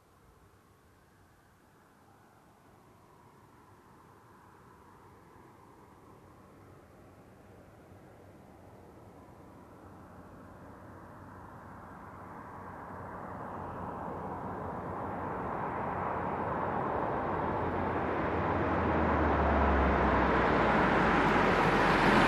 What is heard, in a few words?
Old Truck Parking